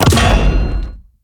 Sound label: imp big hammer